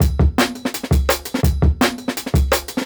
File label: 168 Rapid, Jungle Breaks; Original Jungle Breaks